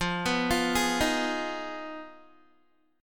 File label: F Minor 7th Flat 5th, Guitar; Fm7b5; arpeggiated; 13,14,15,13,15,x; 4E,4A,5D,5G,5C,6F